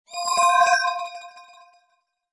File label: Emerge, phonetones notifications; LG; Qua tab PZ LGT32